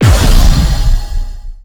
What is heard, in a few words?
tachyon lance fire; weapons; Stellaris